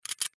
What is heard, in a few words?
combination click; racers sentence tumblers